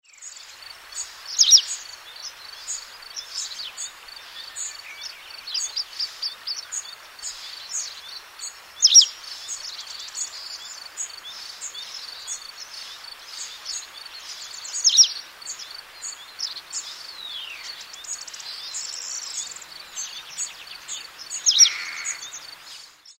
white wagtail

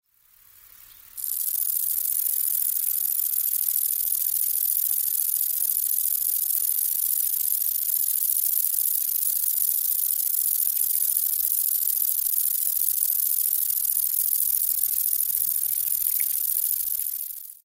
long winged conehead

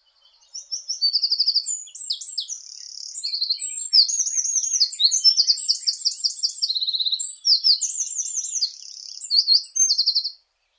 wren

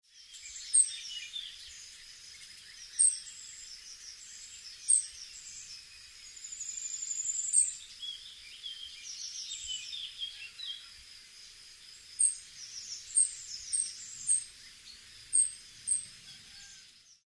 firecrest

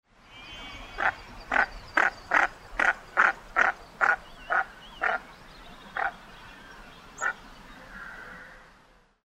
goosander

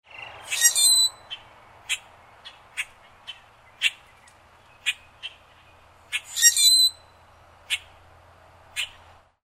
common grackle

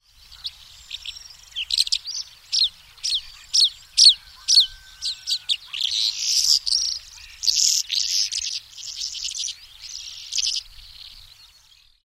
calandra lark

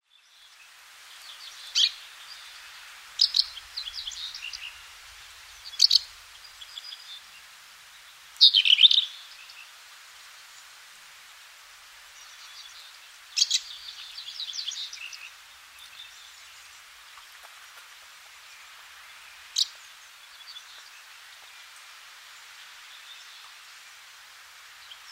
black eared wheatear

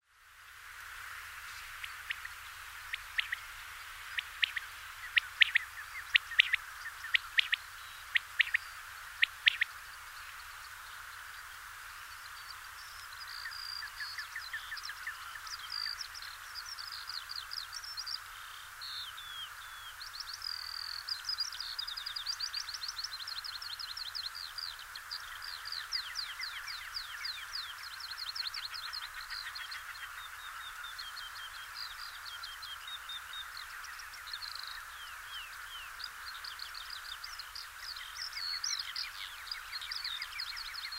quail

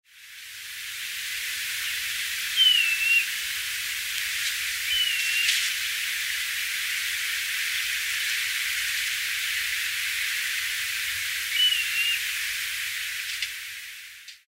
grey plover, nocmig

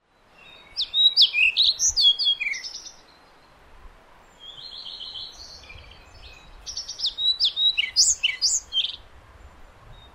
pied flycatcher

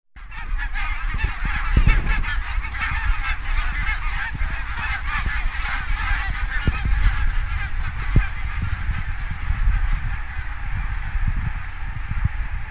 barnacle goose

